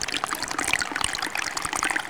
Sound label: Fountain; Levels Hub Restaurant; Fountain Close